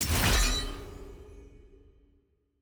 summon click; exalted hub

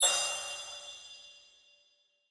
notif gameinvite rcvd; social

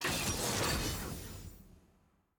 draft left pick double; champ select

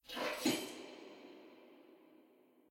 workout squat in

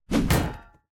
attack blocked

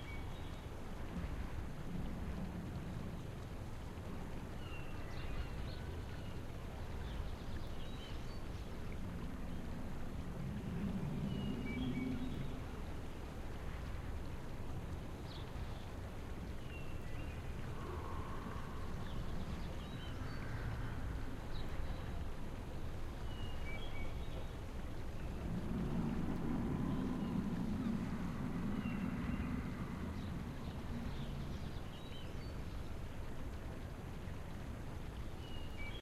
ambience loop; tft set7

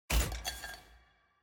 artifact click; vi

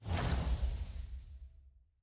store purchase modal champ outro

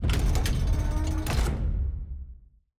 portcullis

